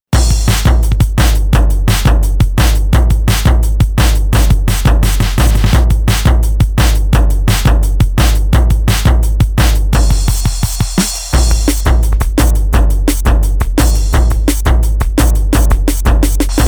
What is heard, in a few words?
Oberheim DX